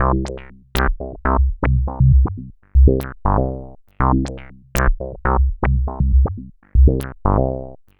Bassline, Prototechno

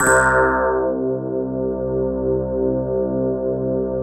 METALLIK C4; Greytsounds - Scott Peers Sound Engineering

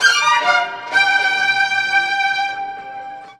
STRG; USB Soundscan vol.32; Roots Disco; AKAI

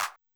Clap, 505; classic machines

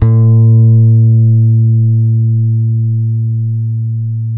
B 3, compresence, bass; Spectrasonics; Bass Legends

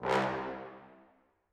trombone tenor stac C2, Brass; BBC

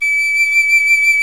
piccolo

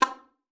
SLPB C4, ALTOSAX; Xsample VOL-2

